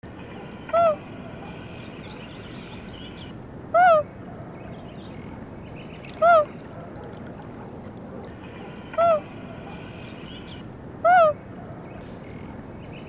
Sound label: hoot